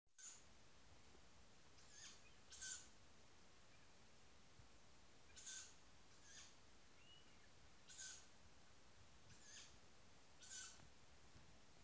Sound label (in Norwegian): fuglelyd